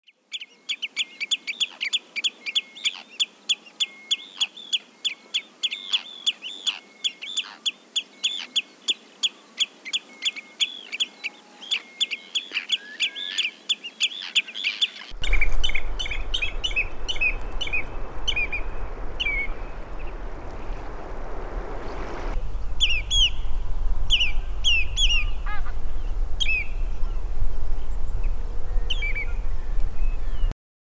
Scolopacidés Tringa totanus Chevalier gambette

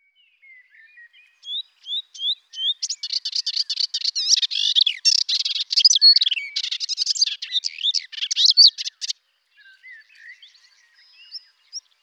Hypolais polyglotte

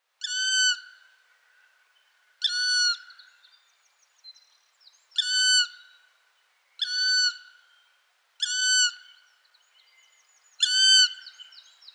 Pic noir Cri au repos

